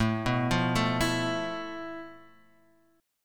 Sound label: F Major 7th Flat 5th A, guitar chord